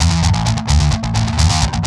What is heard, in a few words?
Boxed, Industrial, Nasty